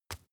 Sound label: tissue tap soft